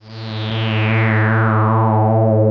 sonic sweep

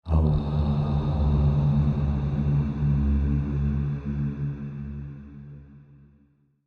monks

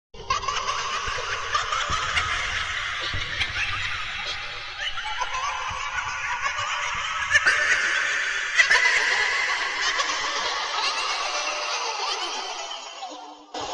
goblins laughing

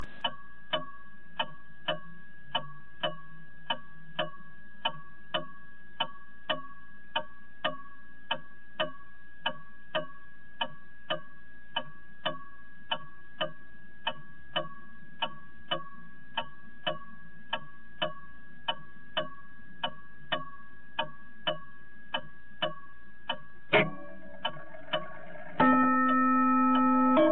ticktock to the hour

